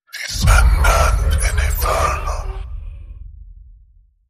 Vengeful Whispers Evil Boom

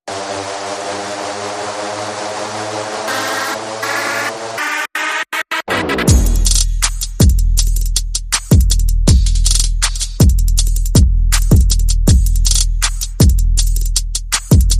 Perc and FX

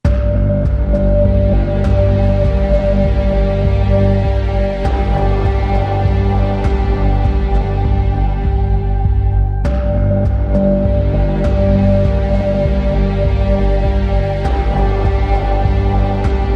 No Choir

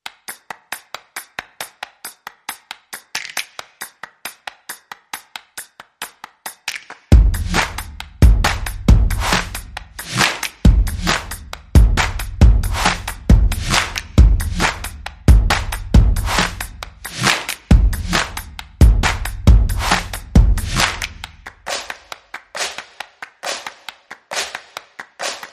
No Drums Less Percussion

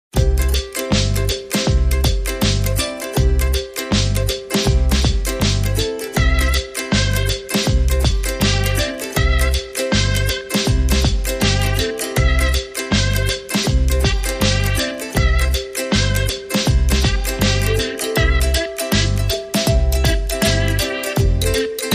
No Slide Guitar

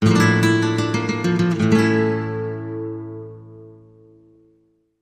Spanish Guitar Bridge

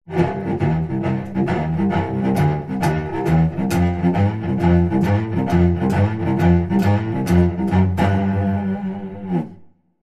Cello Boogie

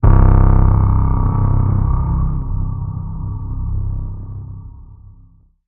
Hit Tonal Dirty Bomb

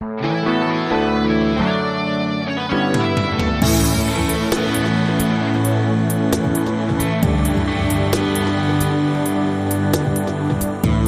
Breaking News Promo

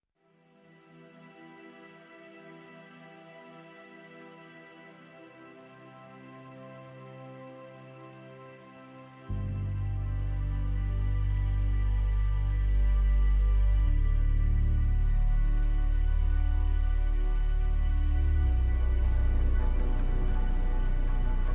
Light Percussion and No Vocals

